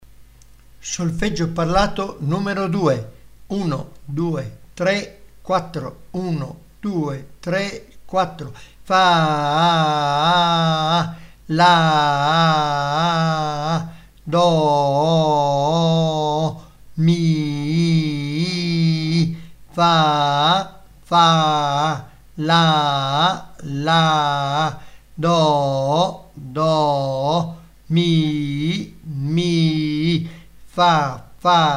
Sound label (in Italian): Solfeggio Il mio amico flauto